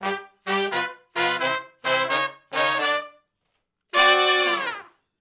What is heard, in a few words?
Sh Bls Clmb Up, Dwn to V Combi (G) har, Horncraft for R&B; Crimson Blue and Fabulous Horncraft 4 RnB; Sections